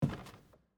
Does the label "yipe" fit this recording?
No